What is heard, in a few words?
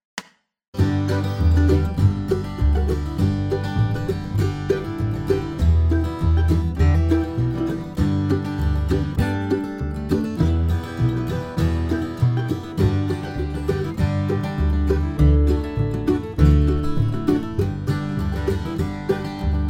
bluegrass